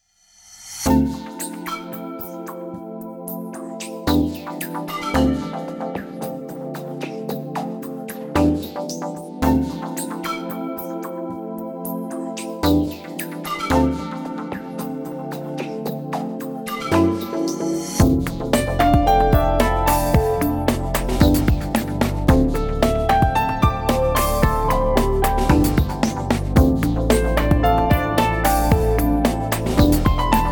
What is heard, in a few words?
Music background pads